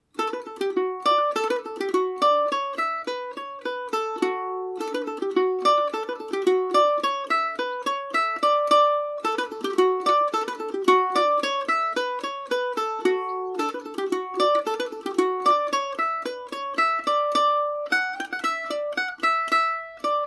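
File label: annaghbeg polka d major, mandolin; Paris Swing Macaferri mandolin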